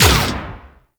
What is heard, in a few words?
Downmix Shot; Protea Prime Boss; Warframes